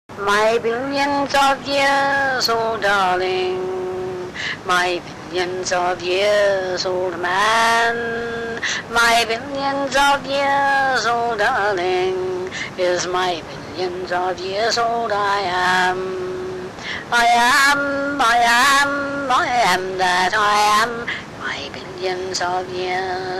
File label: sung